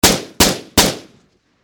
Machine Gun Sig Sg 551 Triple Shot Close Perspective; Warfare